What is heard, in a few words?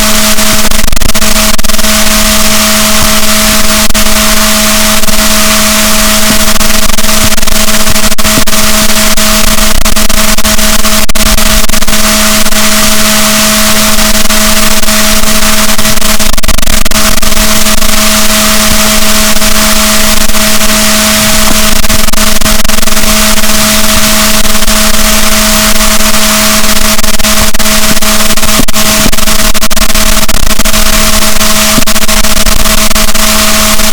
rorquals negatif, boat